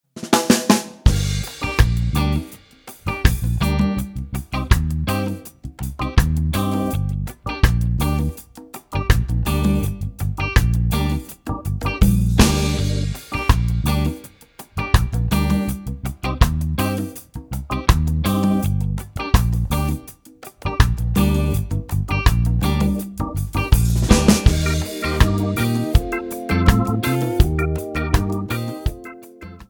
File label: Reggae Man, Bass; Bass Unlimited